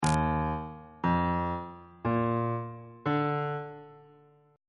e flat major 7th suspended 2nd chord intervals on bass clef